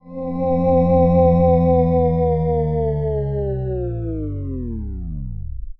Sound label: System Shutdown